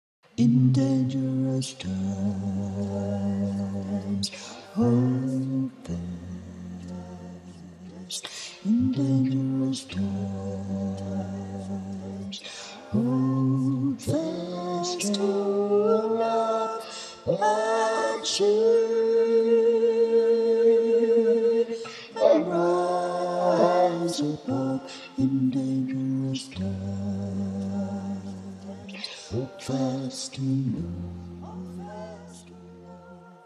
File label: dangerous times chorus bass